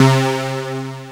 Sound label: Q F-SY WAV L